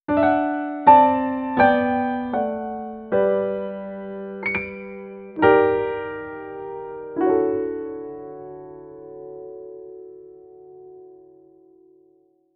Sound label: Piano; Kids on the Slope Original Soundtrack Plus